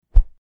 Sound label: Swhoosh; Gameplay